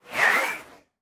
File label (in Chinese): 空中待机声; 旋风人